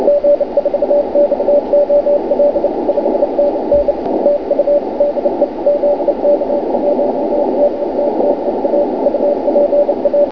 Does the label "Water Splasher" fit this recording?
No